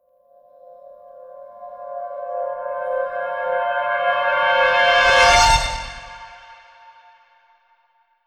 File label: RISER Dangerous Anticipation